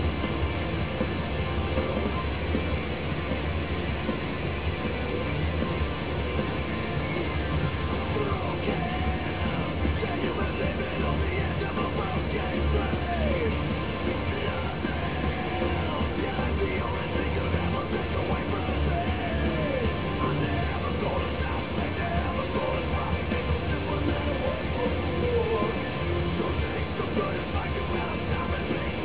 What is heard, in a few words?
phase rock